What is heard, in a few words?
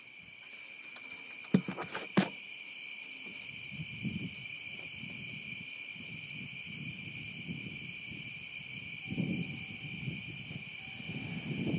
strange bird sound night